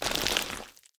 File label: defeat pillars move; smite; god ganesha skin11